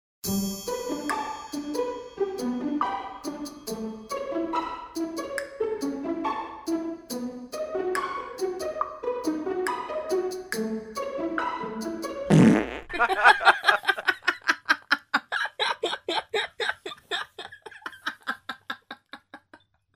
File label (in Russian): veselaja potom pukane potom smeh